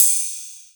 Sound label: Ride, Cymbals; Vengeance Samples